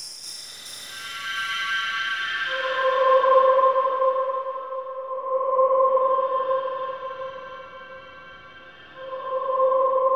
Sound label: MYSTERY, AMBIENT; AMG - Vince Clarke Lucky Bastard